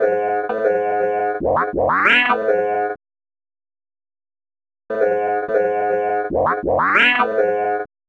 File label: Tape Warp; Club Joints and Street Anthems